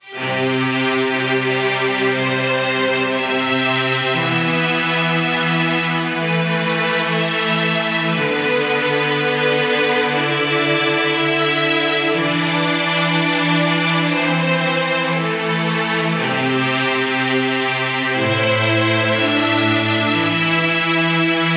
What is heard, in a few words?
Full String Section - C 120BPM; Construction Kits; STEMS; Ghosthack; SkyRealm; Kit 11 - Warriors Cry